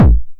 909 BD Tape Sat

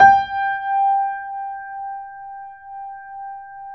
G 4, Keyboards Acoustic, GRANDP ST; USB Soundscan vol.17

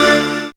syn d, Dance Synths Stabs & Hooks; vol.37 - Dance Synths

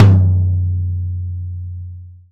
PT3 FF, TOM POP

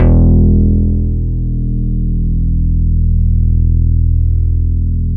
BS MIDI, Synth Bass; Roland - Rhythm Section

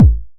909 BD; Goldbaby Tape808 & Tape909; The Tape 909

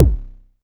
Kick, Alesis DM5

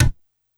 String Slap, Yamaha PSR-E323 SFX Kit

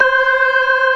ORGAN, C3 NO PERC; Keith Emerson Signature Series

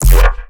bass hit F, Bass Stabs; LateNight; Virtual Riot

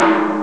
Bongo mongo, Amiga Soundtracker; ST-XX Amiga Soundtracker Sample Packs

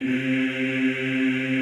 EEH C2, Extended Classical Choir; Best Service

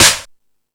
Rap Session, E-MU Pro-Cussion

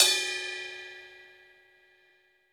Pop Ride Bell, cymbals; Roland BK-5

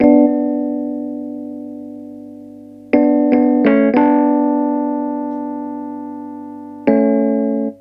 RHOD, Roots Disco; USB Soundscan vol.32; AKAI

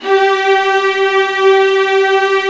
VIOLINS, Synclavier Strings; ILIO

